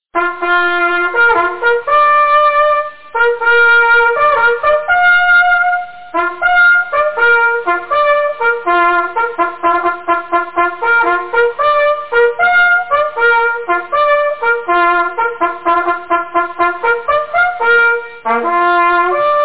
Gaasen, jagthorn

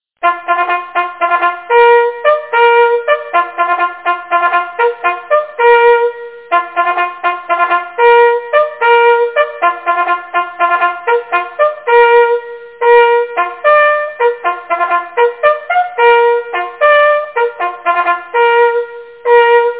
frokostsignalet, jagthorn

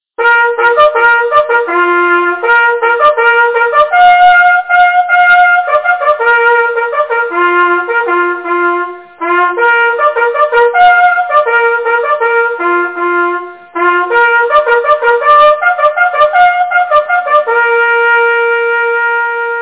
sikahjorten, jagthorn

